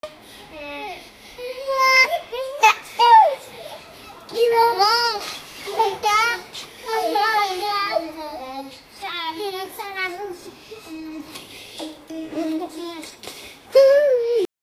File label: a joyful sound of a, commercial